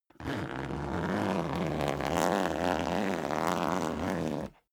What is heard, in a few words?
zipper down jacket open slow; objekte; reissverschluss